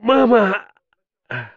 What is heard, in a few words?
military death; STALZONE; customnpcs; stalker